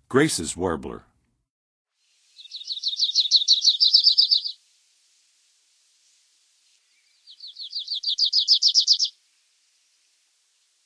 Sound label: Graces Warbler; North America; Western USA; Western USA Songbirds